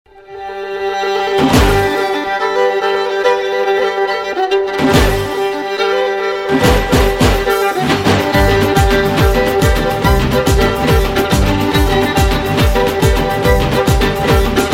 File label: Instrumental